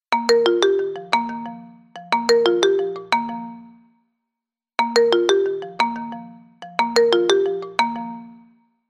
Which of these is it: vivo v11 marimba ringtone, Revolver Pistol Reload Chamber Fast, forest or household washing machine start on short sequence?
vivo v11 marimba ringtone